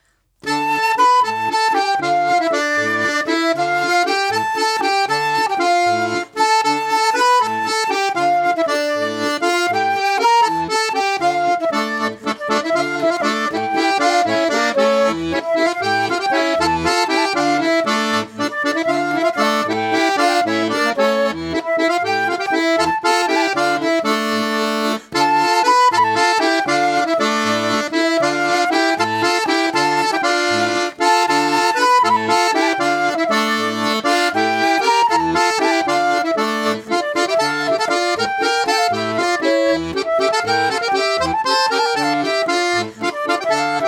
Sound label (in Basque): FLAUTA AKORDEOIA; JOKOA; Mutil txaleko gorri